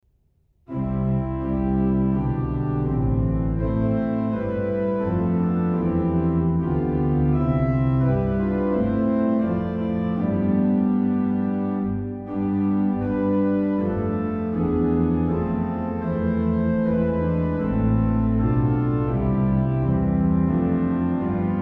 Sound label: hymn